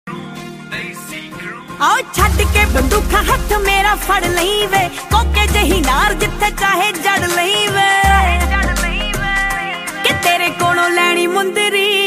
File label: Punjabi Song Ringtone